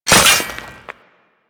Dagger Stone Impact; Dual Daggers; Hit Effects; Weapons

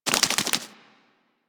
foley idle head shake; Enemies; Warframe; Catbrow

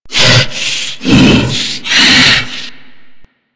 Grineer Hammer Boss Idle, Vocals; Warframe

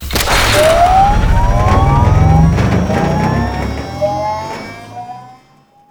Skiff Engine Boost Slow, Vehicles; Warframe; Grineer Skiff Player Vehicle

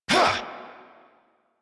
Melee Attack; New War; Erra

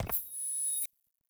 Shrine Puzzle Correct Unlock; Doors; Puzzles

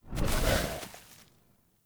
Wolf Steps Run Stop; Animals; Foley Actions

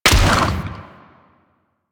Vomit Gun Frenzied Shot, Weapons, Infested; Warframe

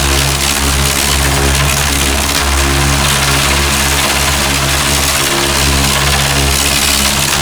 Ocean Electrified Water Loop; Ambience; Warframe; Grineer Ocean

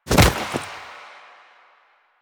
Finisher Grab; Warframe; Kuva Lich; Finishers

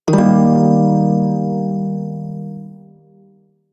Prime Shawzin Blues Chord B; Music; Warframe

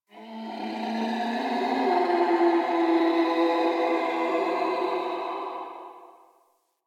Landscape Distant Creatures, Ambience

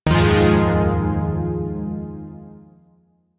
Shawzin Min Chord F; Chords; Warframe; Zariman

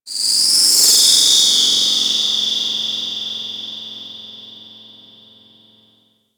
Cephalon Tech Texture Small, Ambience

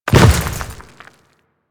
Step Dirt, Footsteps; Warframe; Grineer

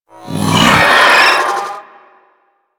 Pet Vocal Pain

